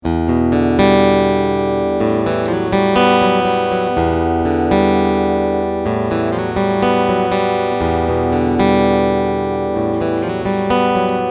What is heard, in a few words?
MID HIGHS FULL